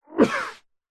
human male man cough single into cloth or arm muffled; zapsplat